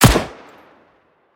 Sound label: Mp9